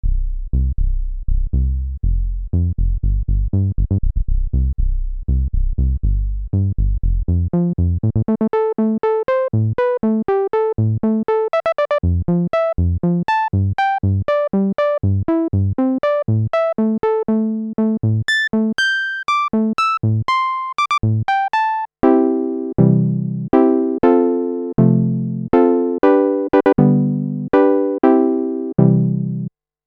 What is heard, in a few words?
roland v-synth xt filter demo tb-filter